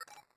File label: Teleport Activate